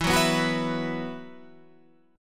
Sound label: E Suspended 2nd E, down, Guitar, Standard; Esus2 strummed